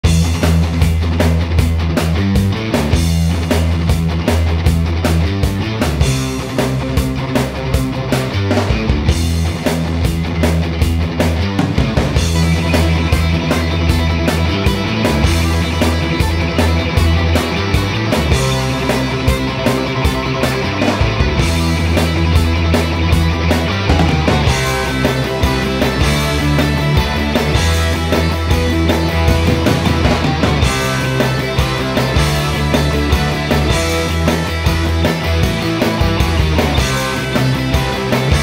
Workshop Action; Stromboli s Workshop 3; Shrek the Third; PS2

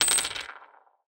Loot Coins Appear; portal quest android

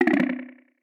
rating down new

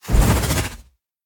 Ghost Strafe; The Town

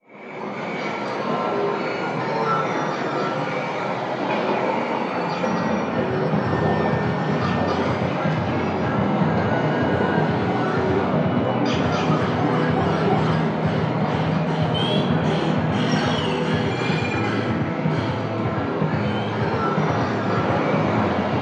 Relaxing Sounds; Picross Genesis Master System Edition

